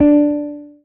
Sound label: marimba guitar; ゆめ2っき